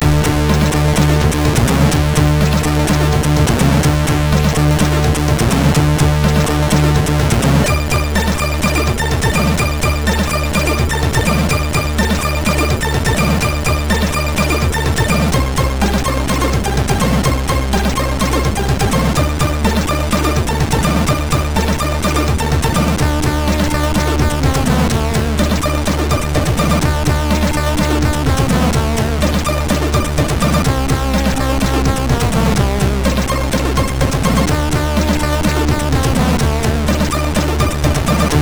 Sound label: sid, MOS6581R3